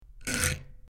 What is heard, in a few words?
Metal Dial Rusty Metal, switch clicks